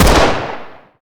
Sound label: fire, glock; Urban-Coalition; Decay; arccw ud